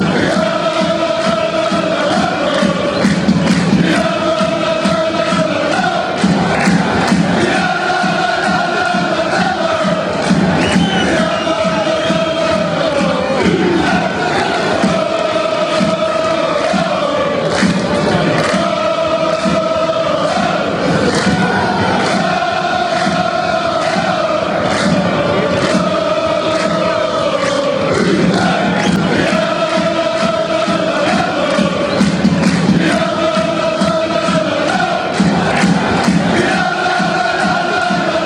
dale, chants; cavese

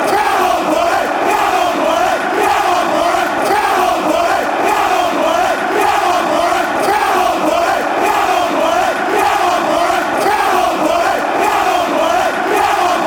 come, chants; boro

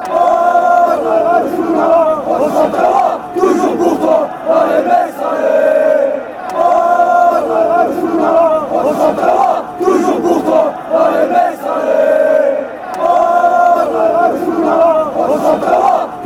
allez allez, fan chants; metz

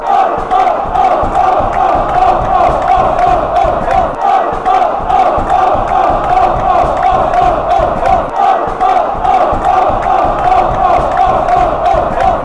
off, chants

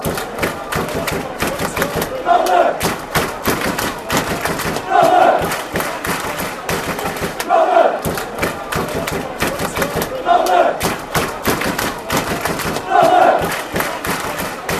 claps, chants; rotherham

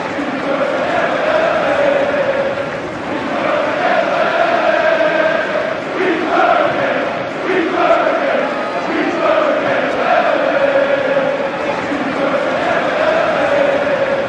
chant, fan chants; mats wieffer; Tuneful rouser for the team for the boys in blue and white. Tope Brighton football chant

